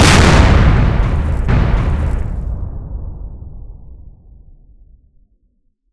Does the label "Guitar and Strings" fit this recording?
No